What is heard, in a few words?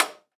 Amplifier Snap Button